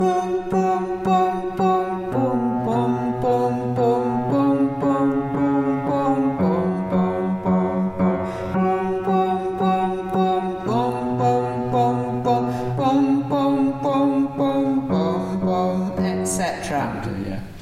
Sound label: Alto Bass